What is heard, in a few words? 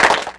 Clapbox Pitch 5, clap; mpk mini presets; Ableton Foundation FreePacks